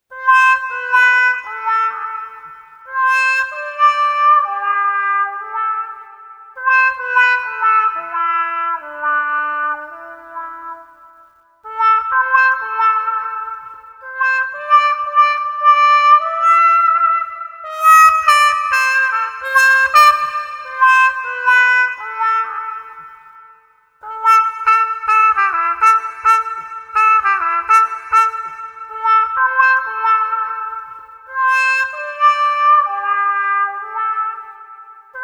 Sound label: Street Trumpet Harmon Wah 115